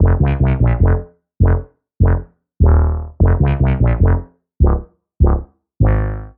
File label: Hip Hop Rap Bass